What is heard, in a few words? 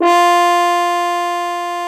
FR HORN F3, French horns; Greytsounds VOL-1